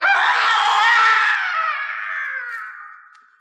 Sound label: scream haunted; Voice; disney